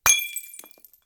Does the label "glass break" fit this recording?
Yes